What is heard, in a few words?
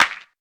sharp hand clap sound f sharp key